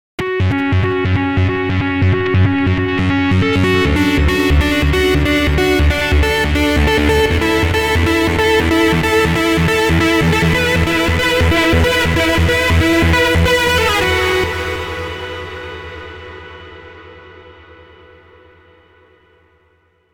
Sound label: Indigo, Korg